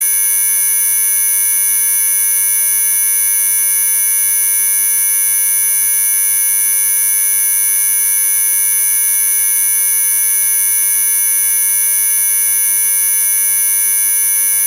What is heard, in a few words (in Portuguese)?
Barulhinho Chato